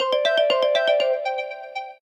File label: Flutter; Samsung Z3; Ringtones